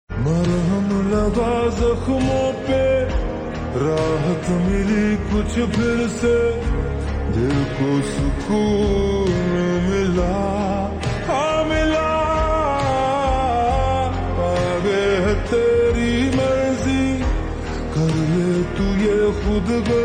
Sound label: Slow Reverb Version Ringtone; Awarapan 2